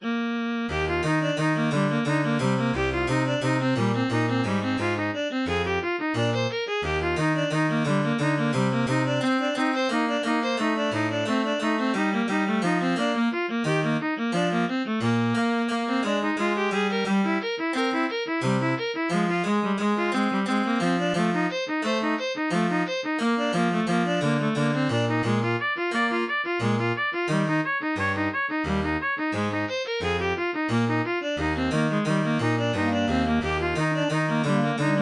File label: MIDI